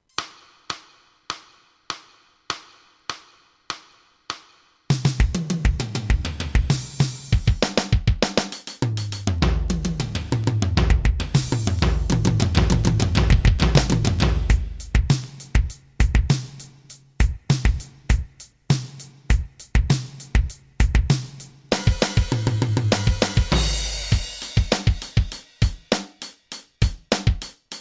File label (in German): Rock Duett; rock duette